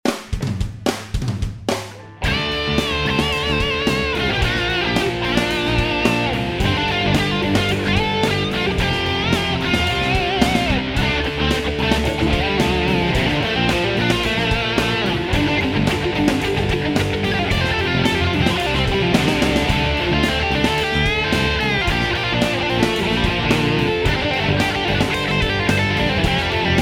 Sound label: solo, pentatonica